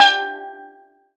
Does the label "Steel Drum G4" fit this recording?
Yes